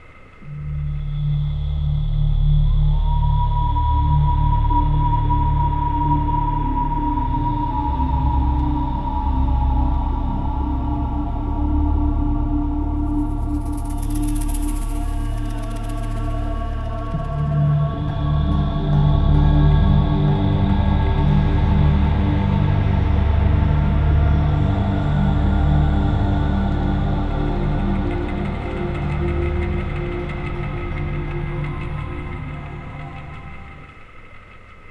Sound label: Percscape; Sonic Foundry (Sony Creative Software); Ma Ja Le; Soundworlds